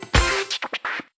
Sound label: Crew Cheer; Subway Surfers City; Android, iOS; End Run Screen Crew Cheer